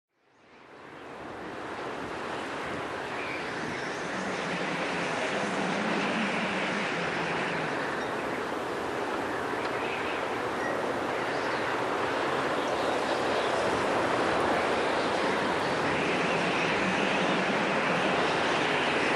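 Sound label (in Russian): ветер в пустыне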